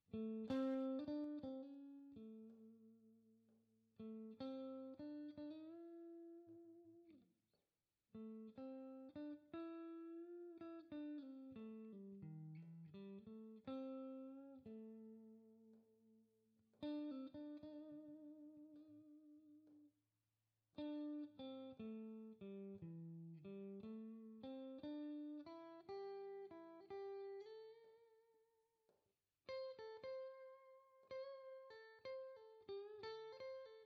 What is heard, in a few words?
Triplet Feel Solo Example, blues guitar